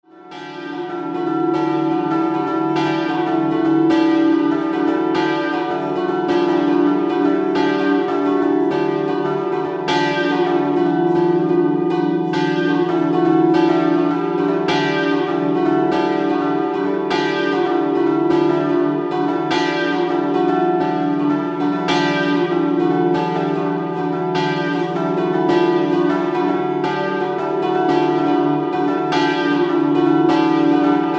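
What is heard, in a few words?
bell ringing; Colloredo Prato; campane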